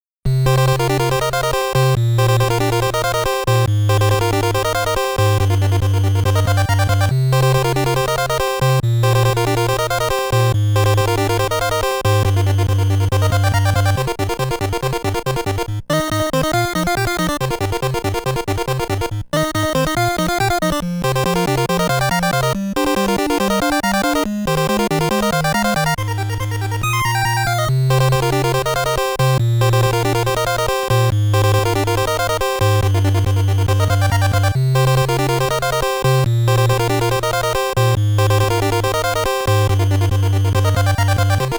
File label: Battle; Ganbare Goemon Sound Treasure Box